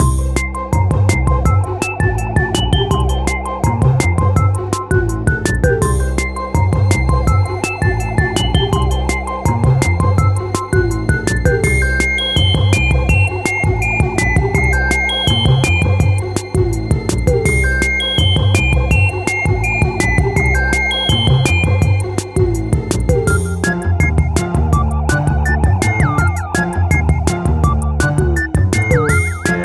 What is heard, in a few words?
Ingame Space Loop